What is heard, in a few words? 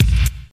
kick drum single shot a sharp key, Royality free steel kick drum tuned to the A# note; kick drum samples